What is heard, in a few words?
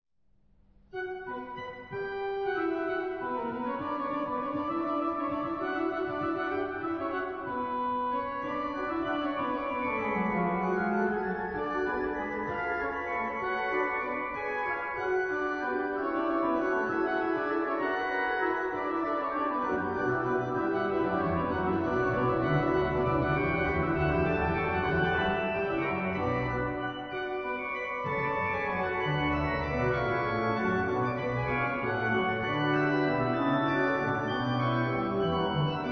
Gigue